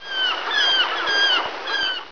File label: birds